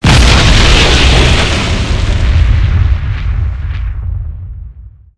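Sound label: Explosion Quake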